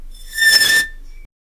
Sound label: person squeezing through